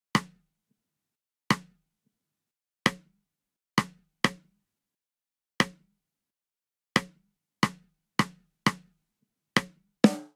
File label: Swing Reggae Snare Only 88 bpm; 4 4; MIDI Clips; Ableton; Factory Packs; Drum Booth